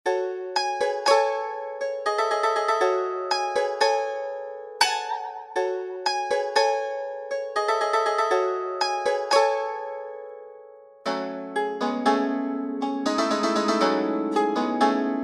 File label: love song dan tranh